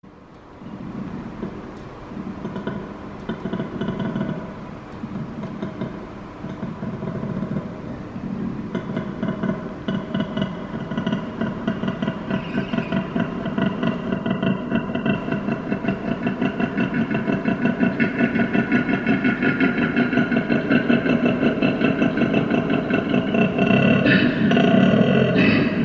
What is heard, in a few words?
koala, animal sounds; オスがなわばり誇示のために鳴くことがあります。; 多摩動物公園; コアラ; オーストラリア南東部に分布。